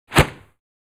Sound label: swish single; Asian Show Sound Effects